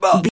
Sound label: voice male grunt pain